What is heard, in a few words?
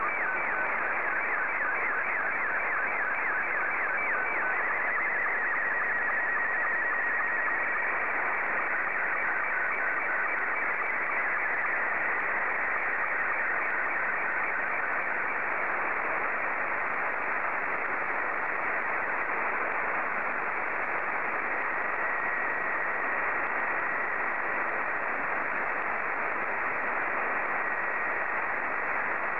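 SSTV, Scottie 2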